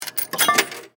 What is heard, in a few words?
Slot Machine, Insert, Coin, Chime, Casino; Sports; Casinos; BlastWave